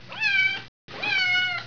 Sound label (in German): katze